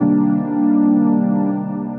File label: Puff Stab (minor 9th), Synth Lead; Mazzy; Ableton; Skitter and Step